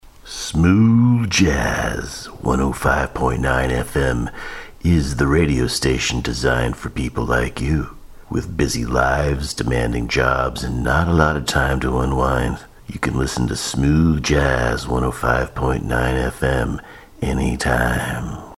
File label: Smooth Jazz